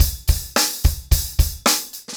110BPM, acoustic drums; The Roots Drumloops

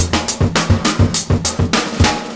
FILLS, 70_s Breakbeats

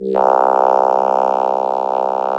VOCODER; VOCODERS; Sound & Vision - Gigapack I CD 2

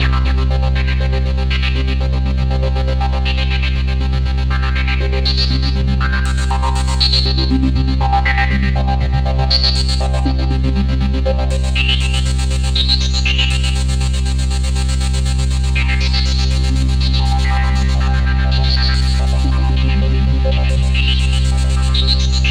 Roland JV 2080, poly saw

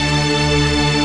chord 1, sound chord

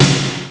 sdrum; ST-XX Amiga Soundtracker Sample Packs

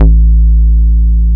HOUSEY A1, BASSES; Best Service Alpha Dance vol.2

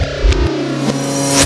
WINDING

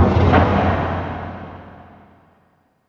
JETTISON, PERC; NorthStar - The Wizard VOL-2; TSAR PERC

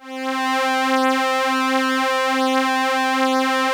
OBERHEIMS

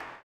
CLAP, Blues Soul; Spectrasonics Vocal Planet

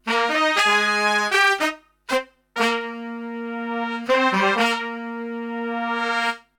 POP AB-BB, Brass; Best Service; Brass Super Section; POP LICK

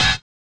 collision; Spectrasonics; Metamorphosis

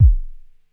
Axxe Kick, ARP Axxe Drum Machines

